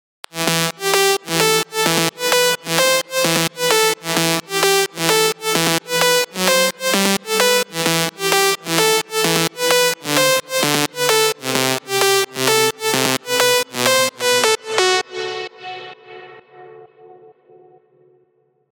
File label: Lead; future rave